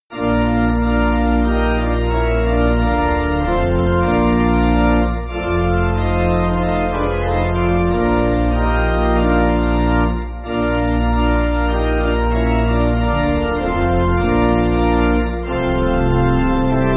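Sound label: Pipe, Pipe Organ, Music Backing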